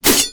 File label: Spear Attack